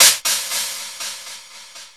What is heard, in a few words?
Echo Hat; Sonic Foundry (Sony Creative Software) - Futurist Drum & Bass; Drums & Percussion